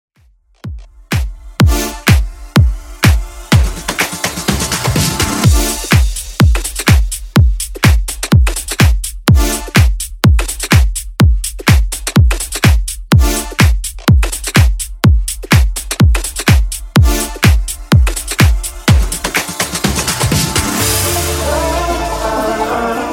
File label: Smashup 125bpm; Bootleg